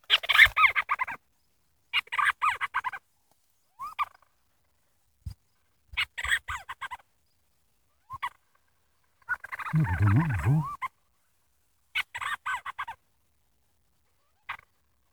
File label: Leachs Petrel Storm